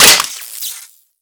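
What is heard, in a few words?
dest computer monitor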